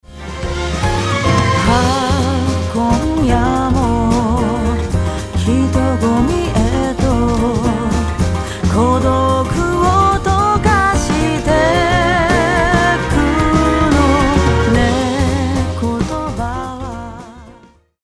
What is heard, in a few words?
Mix Vo Vol On